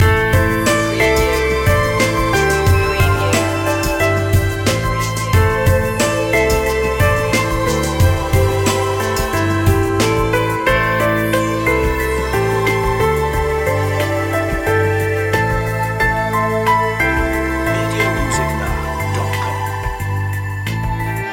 concrete chill loop

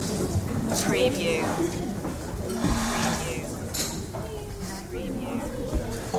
Audience Chatter Crowd Ambience; beanstalk audio

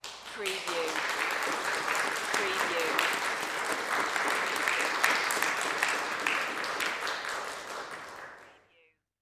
Applause Auditorium; sound effects bible

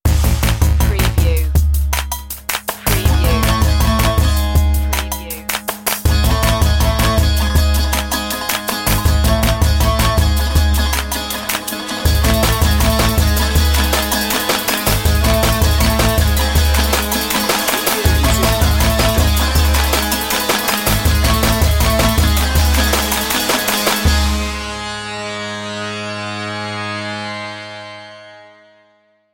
eastern pulses

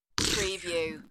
Slurp

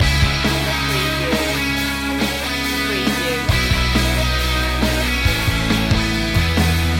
hot rocker loop

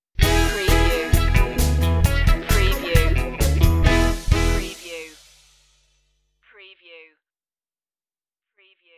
cowboy kartin stinger

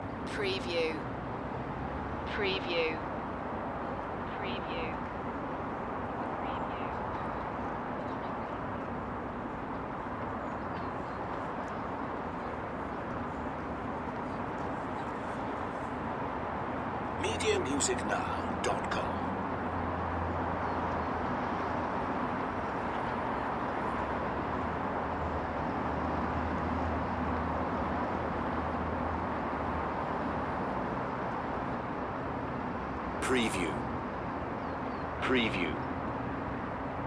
Traffic Semi Distant Light Birds

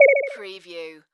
Multimedia Beep

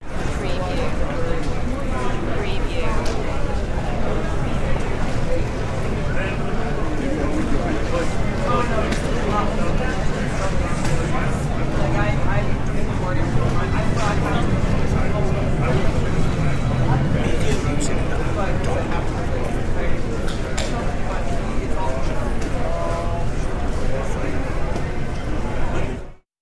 Arprt Amb

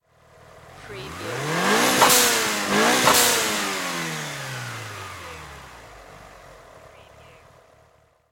MITSUBISHI EVOLOUTION ENGINE DOUBLE REV

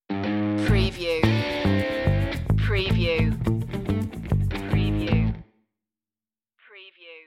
casino funk stinger

